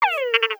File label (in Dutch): Lokroep Stadskikker